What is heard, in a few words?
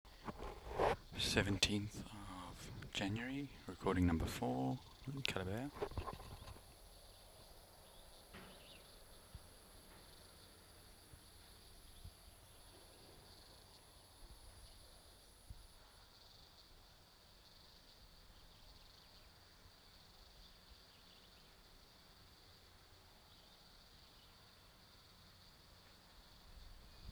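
Platypleura chalybaea, Zuurberg Pass; P.chalybaea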